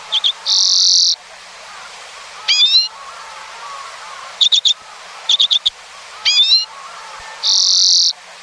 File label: Hoary Redpoll, songs